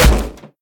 hit; smite; cabrakan

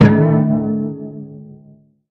defeat lyre powerchord; smite; Apollo

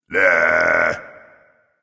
tongue, voice; Smite; ymir skin9

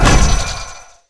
stun; smite; ahpuch skin10

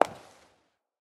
footstep; Smite; Baron Samedi

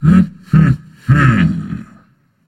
thinking; smite; hades

